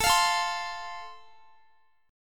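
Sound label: A Minor Sharp 5th, Guitar, chord strum; strummed; Am#5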